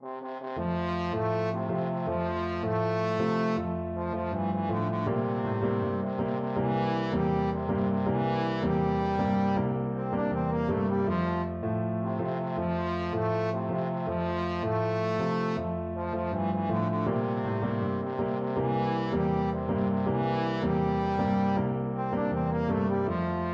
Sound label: trombone